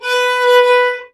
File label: Violin B; Oleviolin; pitchglitch